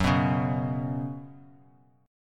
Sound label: Gb Suspended 4th Gb, down; strummed; JGuitar